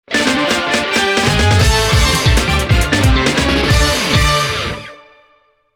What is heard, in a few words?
Victory!